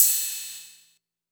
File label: Ride, Cymbals; Minimal House